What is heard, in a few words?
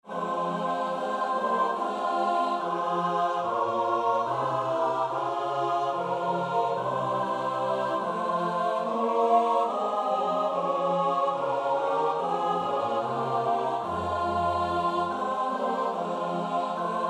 Choral Ob bei uns ist der Sünden viel (S-A-T-B); Aus Tiefer Not Schrei ich zu dir (Op.23.1)